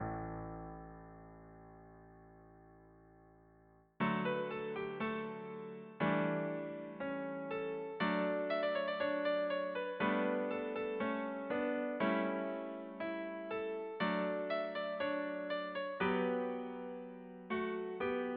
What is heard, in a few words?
oboe